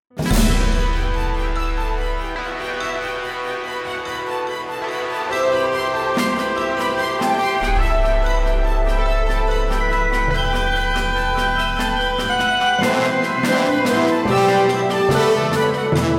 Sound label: fight; Dill Scale